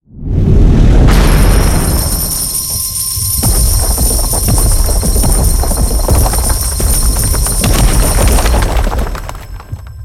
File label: green Flame; hiddencoinsofegypt